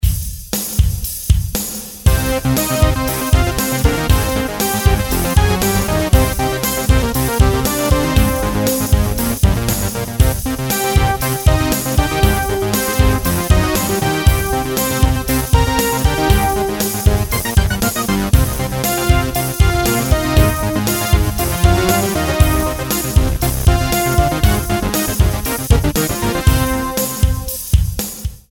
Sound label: 80's Poly Synth